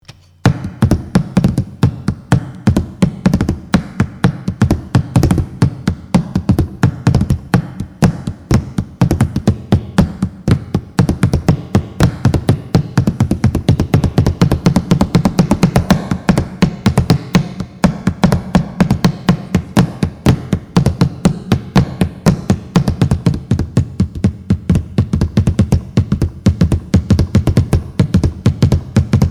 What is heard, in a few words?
fingerdrumming